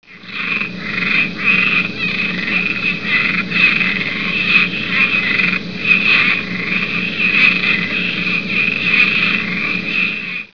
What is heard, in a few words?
cuban treefrog; frogs toads; wildlife